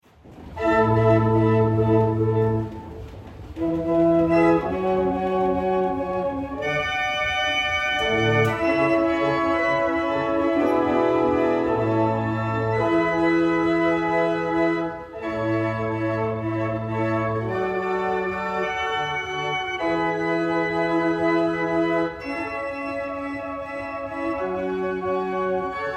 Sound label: Double chant, barrel organs; Norton